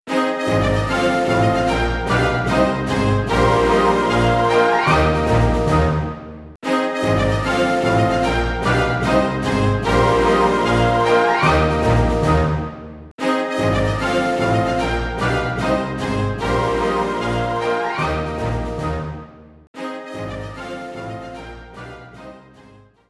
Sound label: Success